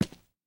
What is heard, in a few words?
rails, walking; Factorio